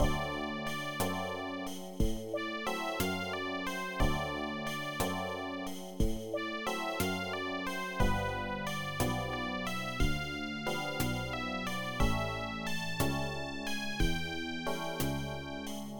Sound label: blues